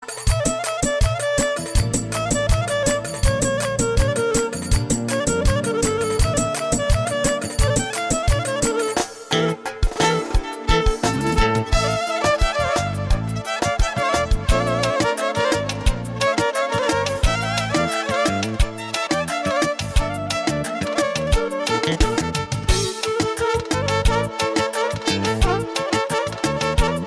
Manea Instrumentala

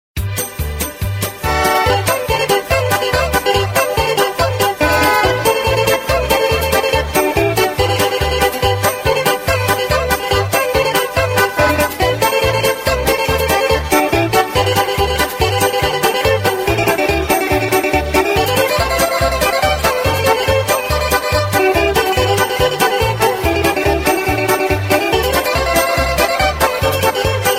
populara

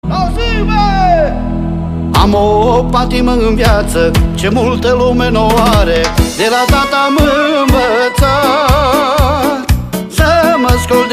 populara

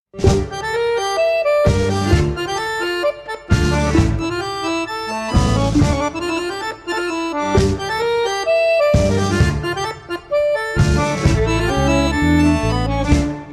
La Gitara Romana

